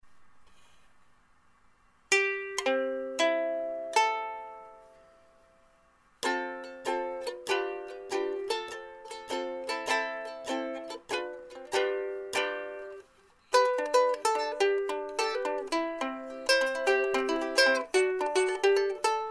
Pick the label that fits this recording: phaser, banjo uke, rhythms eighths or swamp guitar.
banjo uke